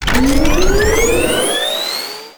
Hoverboard Jump Charge; Vehicles; Warframe; Venus Hoverboard

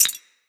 Point Drop; Greed; Pickups

